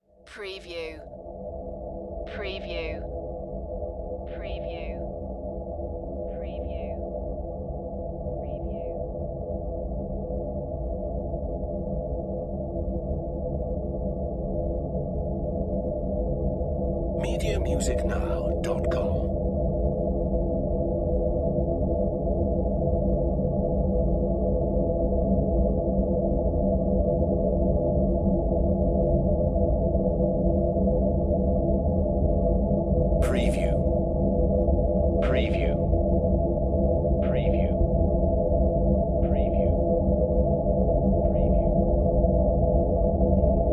Drone Airy Build; Sound Effects Bible

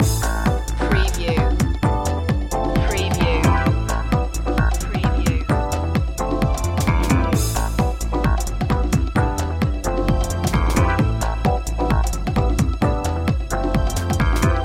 beach life loop

